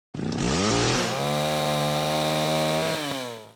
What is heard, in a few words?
saw; Tool